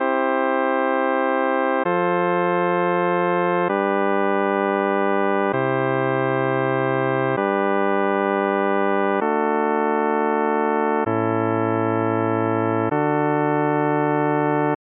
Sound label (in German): modulation von c nach d